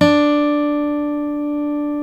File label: STEEL D, FOLK STEEL Guitars; Spectrasonics; Hans Zimmer Guitars VOL-1